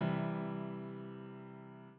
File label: c major chord